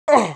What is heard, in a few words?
damage, pain; mohaa; dialogue